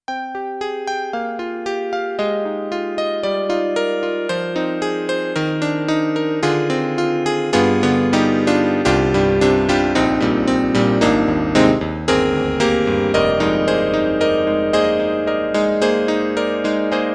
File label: Yamaha MU90 Electric Grand Piano